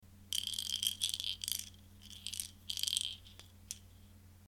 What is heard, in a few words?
baby s rattle